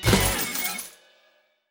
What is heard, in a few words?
small Win